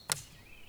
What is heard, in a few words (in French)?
Branche qui casse; Percu Bois